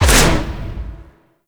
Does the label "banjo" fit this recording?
No